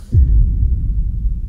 suspenseful

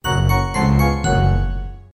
key alert; Luigi's Mansion

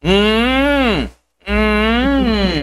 hmmm hmmn

